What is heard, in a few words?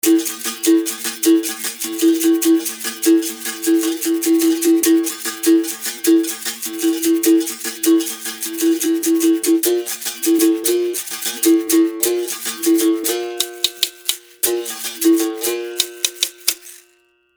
berimbau